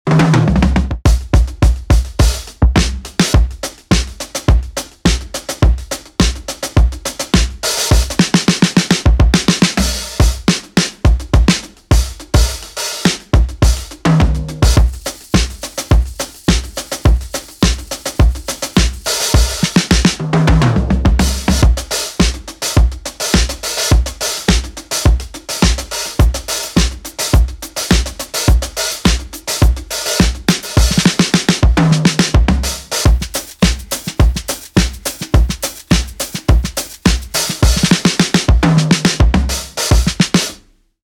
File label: Crispy Drums Sample; crispy drums sample packs pamcv studio